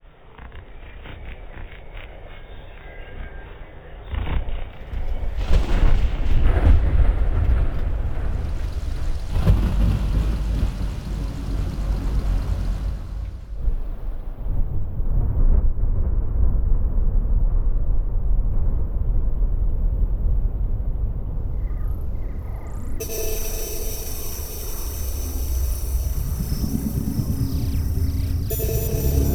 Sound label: Sci Fi Sound Effects and Soundscapes; Xen World; Bluezone